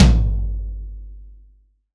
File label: F-TOM 20, PIN STP TOM